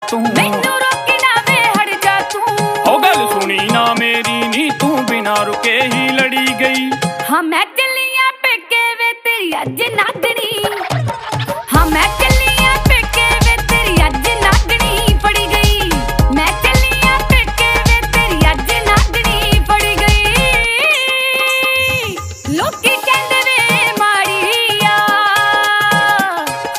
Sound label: Ringtone